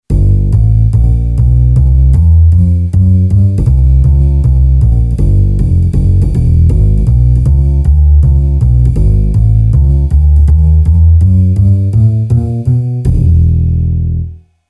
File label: Bass & Cymbal; Yamaha PSR-550; Voice